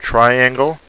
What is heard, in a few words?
TRIANGLE; Más de 2,500 Juegos